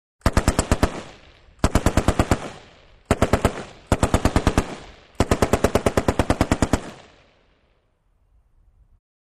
M-60 Automatic Machine Gun Bursts 2; Medium Perspective.